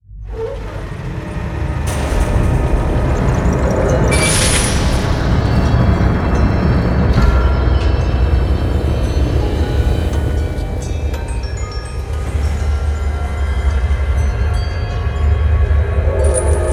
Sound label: OPEN DOOR